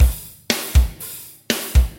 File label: metal